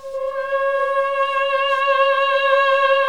MMH C#3, CLASSIC VOX

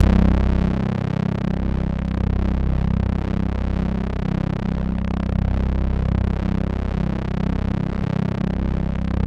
SUB WAVE, Special FX Twisted; USB Soundscan; AKAI

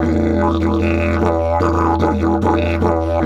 E 150, DIDGE RIFFS; Big Fish Audio - Didgeridoo and Other Primitive Instruments

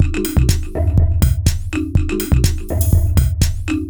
G# 123bpm, House Drumloops; Zero-G House Fabrik Drumloops

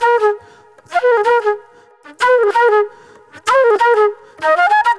NY 70's Jazz Flute

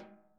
SNARE, Interactive Drum Kits

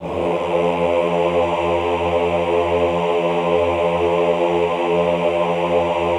AHH F1 R, Classical Choir; Best Service; Extended Classical Choir; AHH LO+HI LL

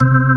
ORG, Organs; Sound & Vision; Gigapack I CD 2; ORG B-3 Organs

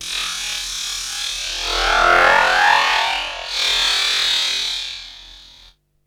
FX, Drum & Bass

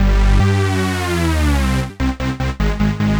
Wavee 150 G, future rave samples, 150bpm

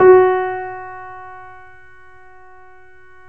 Yamaha C7, 3D Pianos; E-MU Producer Series Vol. 5

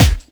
SD, HIP, Dance Mega Drums

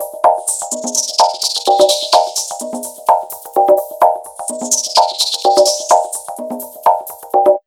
126 PERC, PERC LOOP, Fresh Disco House; USB Soundscan vol.20 - Fresh Disco House I

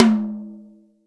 tom, Real Drum Kit, funk kit

